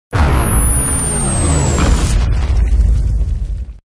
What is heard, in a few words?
sunstrike, dota 2